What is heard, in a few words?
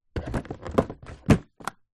game box card close; zapsplat